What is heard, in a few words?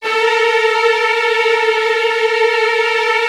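Virtuoso Strings, violin trills, A2; ILIO; Kirk Hunter Virtuoso Strings VOL-1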